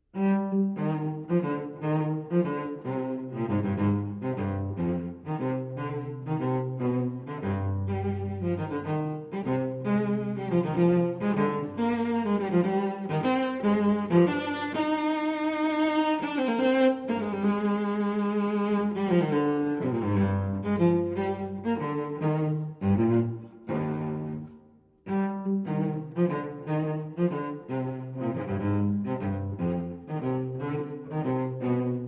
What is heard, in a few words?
Cello Suite in C Major Gigue; Cello Suite No. 3 in C Major, BWV 1009; VI. Gigue